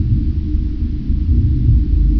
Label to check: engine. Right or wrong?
Right